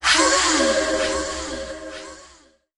"ha", Voice

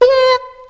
eep, Voice; ooh ahh

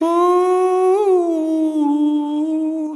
voice ghost sound; Loops; Sad

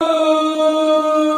"maladjusts", Voice

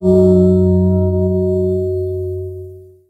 chime, Synths; Notes

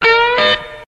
two blues

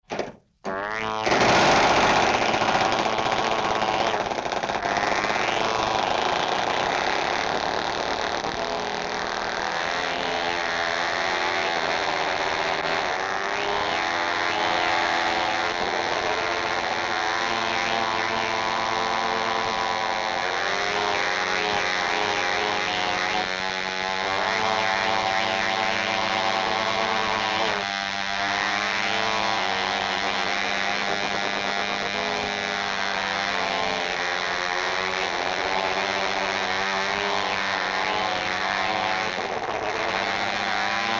airplane toy plane

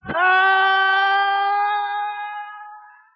scream up; Voice

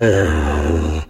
ah orgasm; ooh ahh

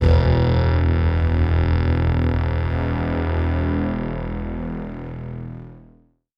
deep dark borrow, Synths

